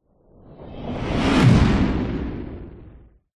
sound design cinematic whoosh dark fast horror impact; zapsplat